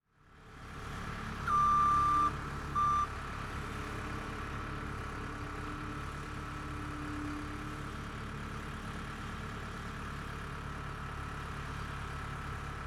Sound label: Industry Ambience Construction Tree Planting